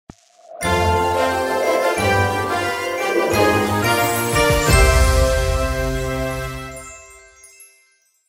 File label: Unlock Music